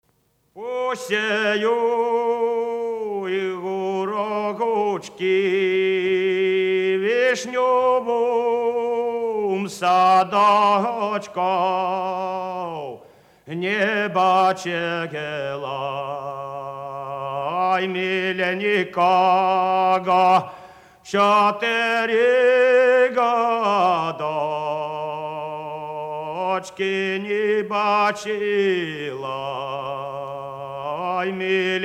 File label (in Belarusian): БЕЛАРУСКАЯ НАРОДНАЯ ПЕСНЯ; ПАСЕЮ ГУРОЧКІ